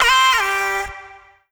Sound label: Omnivox oneshot Dm, Vocal; One Shots; Slate Digital Omnivox Vocal Sample Pack